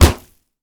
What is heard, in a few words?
punch grit wet impact